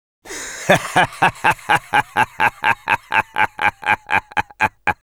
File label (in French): laugh long; Sniper; fr